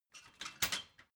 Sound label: ladder metal handling short